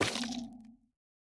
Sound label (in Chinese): 幽匿脉络破坏声